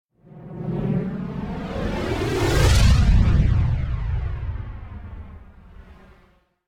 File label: Whoosh Explosion Fly And Destroy - Phased; Hollywood Edge; sound designer's toolkit v2